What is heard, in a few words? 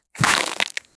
frozen bear roar icy bite; snow crunch